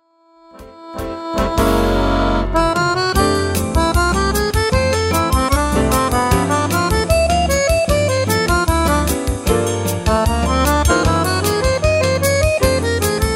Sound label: jazz time